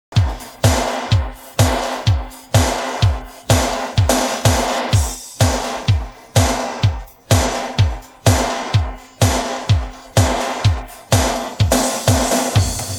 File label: pattern, drums